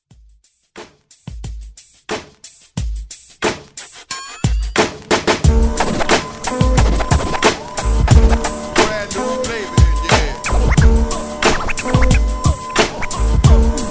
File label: Old School Redrum